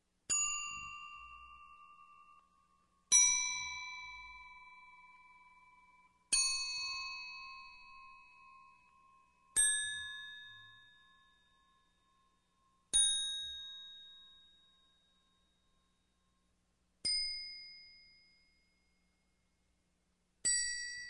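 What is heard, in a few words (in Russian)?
одиночные колокольчики в разных тональностях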